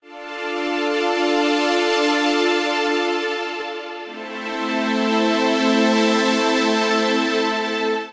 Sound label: Pad (Dm) 118bpm; Music Loops; Gracious Fields; Ableton; Singularities